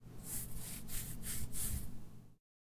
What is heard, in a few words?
ointment end; Items; Medical